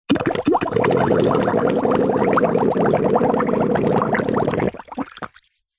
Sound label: leisure whoopee cushion air release water shallow far pops bubbles high pitched; strained